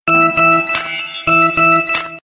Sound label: Nokia Unique Sms, SMS Tone